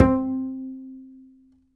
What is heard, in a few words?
G, G string upright bass; Upright Acoustic Bass